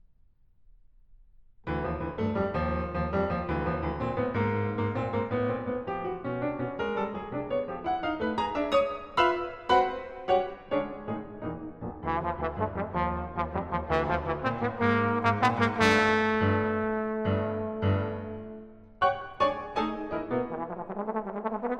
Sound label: Sonata for Trombone & Piano, Allegro energico